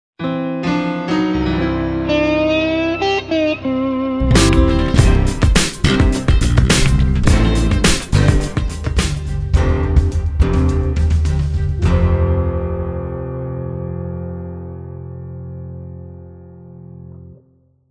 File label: Blues